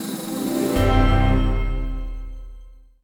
Level End; Shadowhand; macos windows gamerip 2017

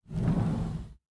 sliding door OPEN; PC Building Simulator

